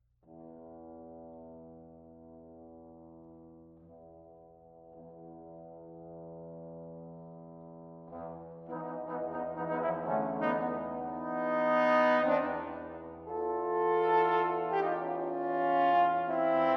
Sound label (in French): Double Concerto pour Trombones; Mvt. 3. Interlude